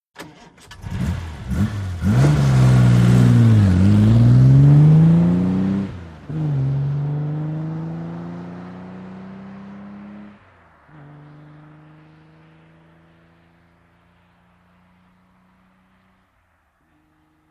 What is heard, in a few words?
VEHICLES ASTON MARTIN: EXT: Start, depart, faster